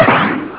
Something Thrown

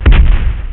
can man

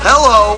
Disk In

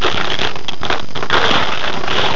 SHUFFLE; BRAIN ACTIVITY

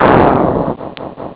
Lava

